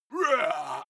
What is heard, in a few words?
male shout hit